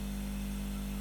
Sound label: Electricity, Buzz & Hum, Fluorescent Light, Buzz & Short, Power Up, Light Switch, Off